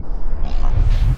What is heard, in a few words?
Punch Right Speed Up; Thug1; Pitch Black - A Detective's Demise